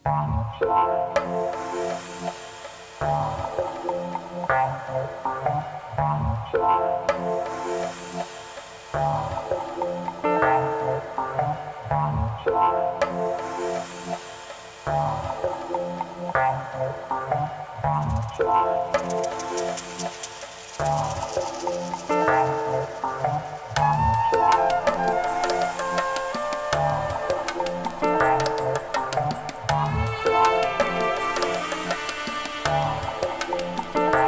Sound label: flute